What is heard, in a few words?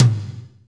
tom, fast shuffle, Roland